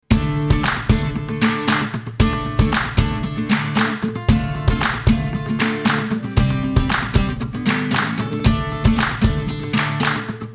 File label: clapping acoustc punk; MIUI12